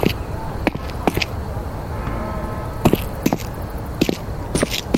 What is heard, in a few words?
walk